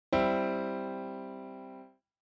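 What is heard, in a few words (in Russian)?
квартсекстаккорд до мажор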